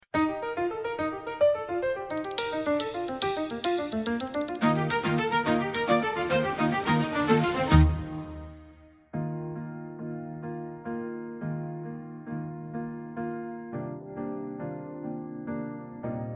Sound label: Instrumental